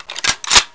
bolt in, 50cal

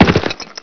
dirt, player; firearms

